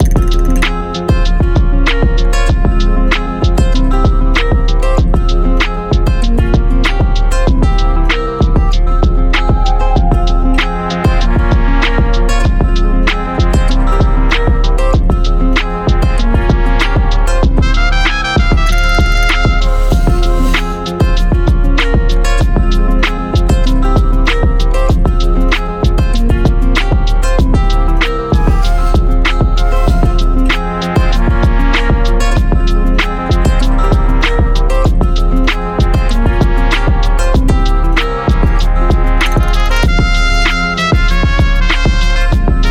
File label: mariachi gang-Boss theme; WrestleQuest